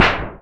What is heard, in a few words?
Thunder Sheet Hit; Digital Sound Factory Virtuoso